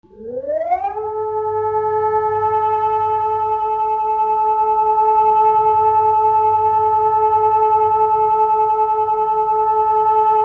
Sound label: PWS All Clear Signal